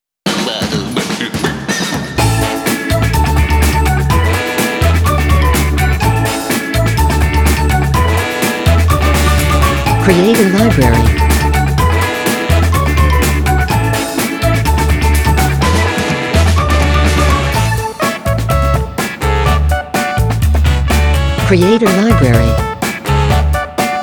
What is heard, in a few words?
Summer Dance, cinematic music